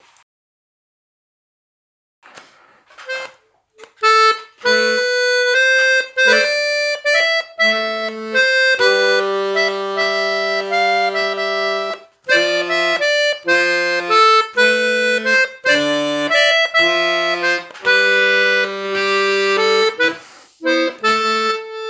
Fisel Ton Double avec basses

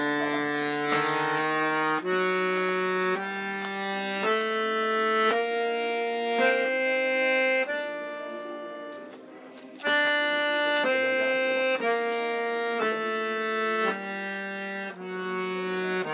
Gamme Ré Mineur Oriental avec Mi Bémol; Kost ar c'hoad

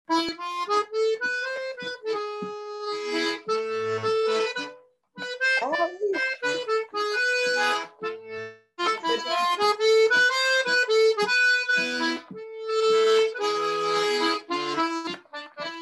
Klezmer; TireLAiguille

